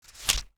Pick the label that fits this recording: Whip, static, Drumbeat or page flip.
page flip